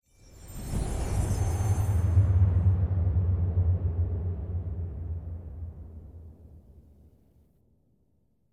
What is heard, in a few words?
Level Warp; Journey